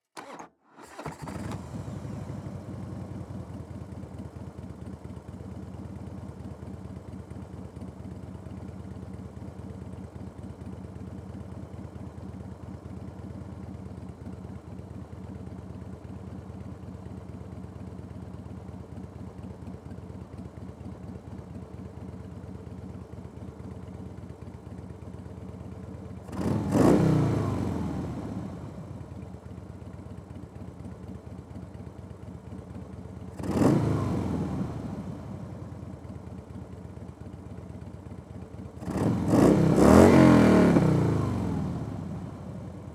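Harley Davidson Night Train, onboard, IDLE, Start Steady Blips Off, ENGINE; 2004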